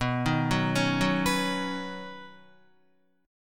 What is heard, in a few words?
B Major Add 9th, Badd9 chord, Guitar; arpeggiated; JGuitar